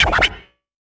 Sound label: Yamaha CS1x Drums